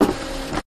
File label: Dot Matrix Printer Form Feed, Short